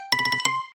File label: notification ringtone; lg velvet 5g uw